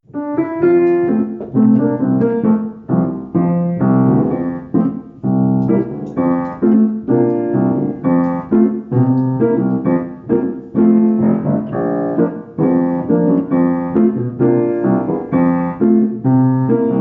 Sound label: Floral Dance accompaniment